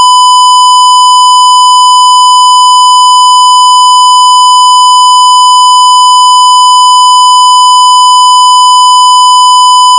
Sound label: square